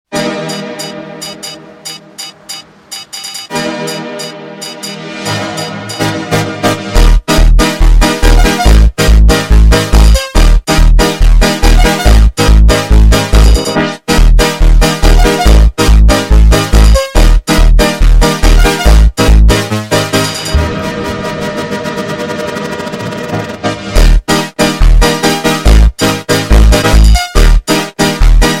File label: instrumental sped Up